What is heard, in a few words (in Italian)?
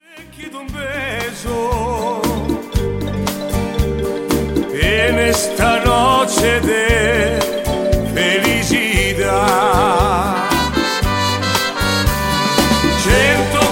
Cento chitarre